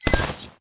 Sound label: crane disembark; Titanfall 2